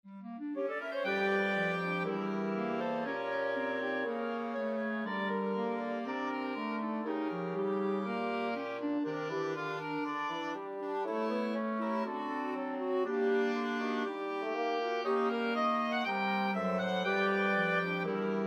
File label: Blue Pimpernel for woodwind ensemble; Blue Pimpernel, Op.41; Henderson-Sellers, Brian